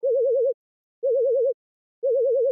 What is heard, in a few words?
warble 500